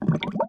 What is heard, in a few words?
menu bubbles